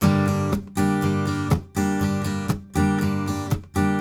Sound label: Blues Guitar Samples, RIFF; ILIO; Fretworks